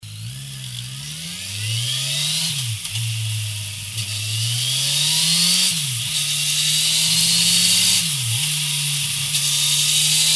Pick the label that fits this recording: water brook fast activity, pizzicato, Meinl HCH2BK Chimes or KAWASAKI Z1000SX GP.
KAWASAKI Z1000SX GP